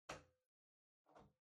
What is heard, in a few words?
Pendant Light Far Ceiling Socket Unplug